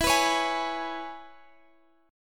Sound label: E 6th, down; strummed